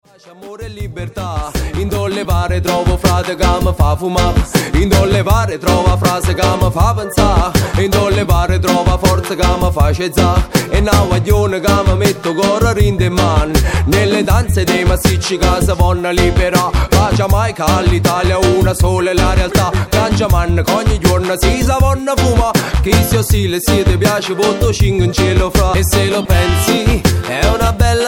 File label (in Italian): reggae